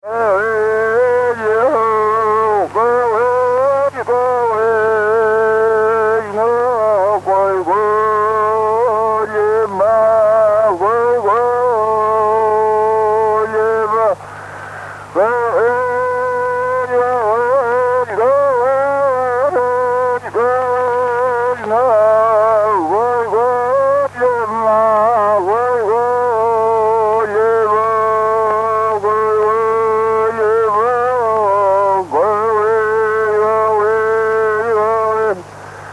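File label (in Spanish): Canto de mujer, Mapuche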